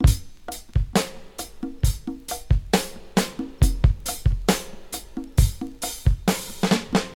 67 bpm drum beat g sharp key, Free drum loop sample - kick tuned to the G# note. Loudest frequency: 1810Hz